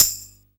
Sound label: Perc, retro house samples, Drum Hits